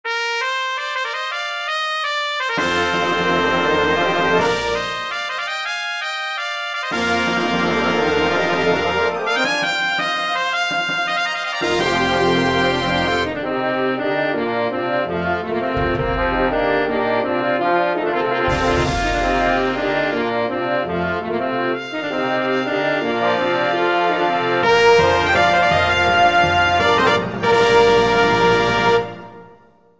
fanfare

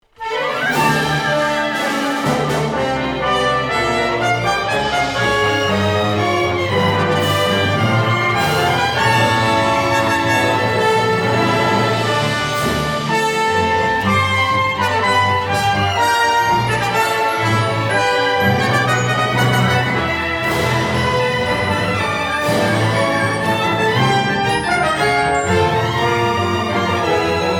Fanfare

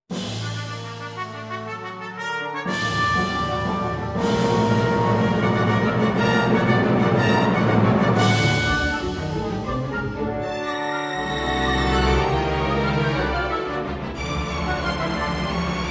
Fanfare

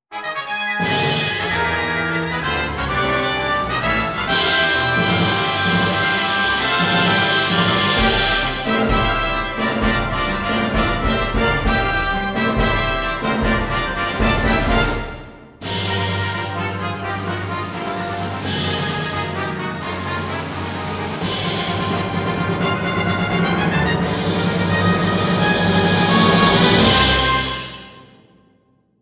Fanfare

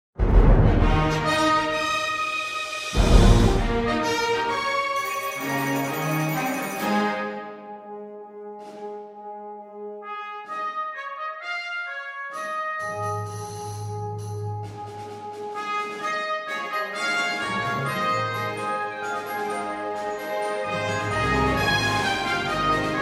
Fanfare

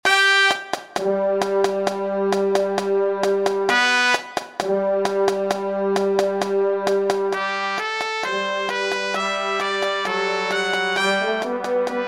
Fanfare; short score

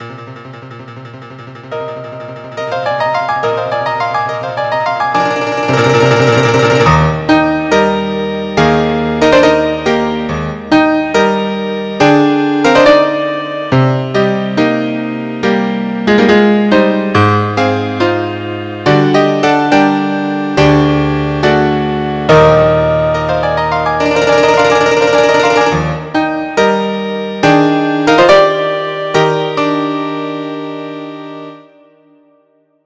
Fanfare MIDI; short score

